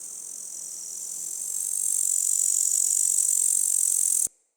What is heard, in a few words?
Meadow Close Grasshopper; Day Animals